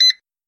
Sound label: clock timer